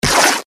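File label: weapon sword deflect cloth; conan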